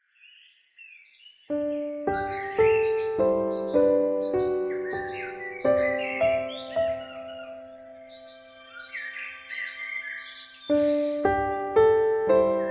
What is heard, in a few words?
Samsung Galaxy S4